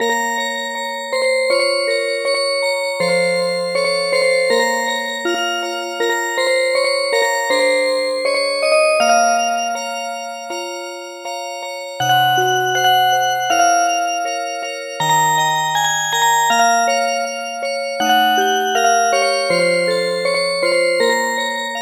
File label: Music Box